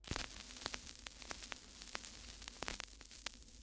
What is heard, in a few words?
Vinyl Crackles